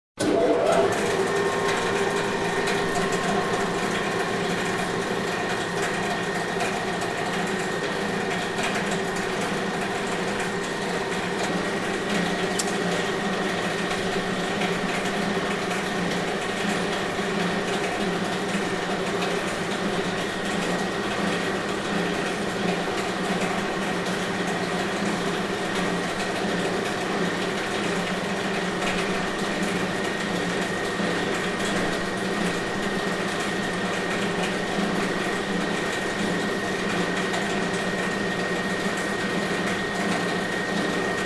Industrial Mixer Machine Turned on

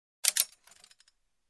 Tighten once

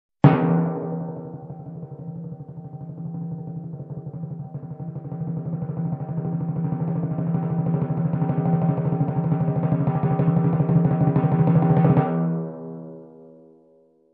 High timpani crescendo

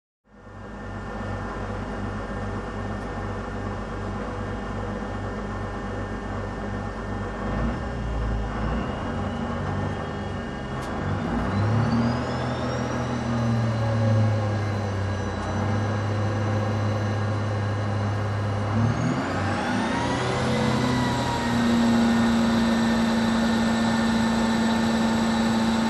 Military Truck engine sounds

